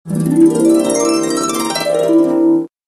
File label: harp alert; ringtones; sms